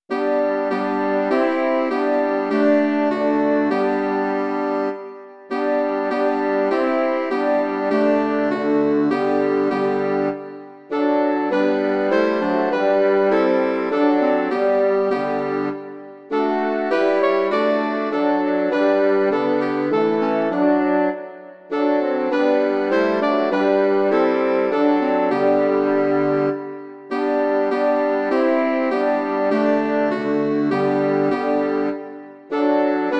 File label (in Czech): 2 klarinety a 2 lesní rohy; Noci tmavá, ustup zpátky; Imaginativní verze